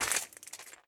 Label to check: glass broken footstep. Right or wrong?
Right